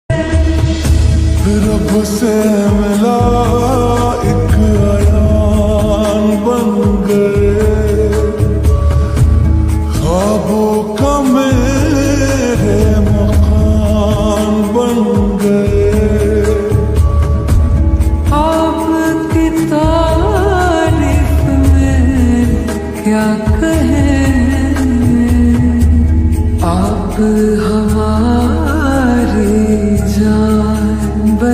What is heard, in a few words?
song ringtone hindi love ringtone; khuda haafiz